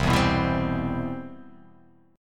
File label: C Major 7th, chord